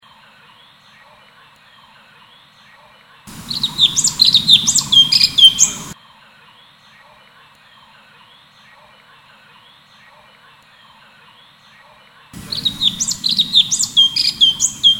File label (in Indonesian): suara kicauan cucak cungkok